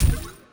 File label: wild stacks